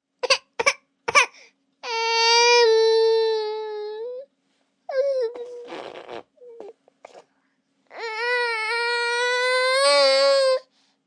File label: Baby Cry, Baby, cry, 1 yr., angry; Crying Sound Effects; human voice, kid, child, infant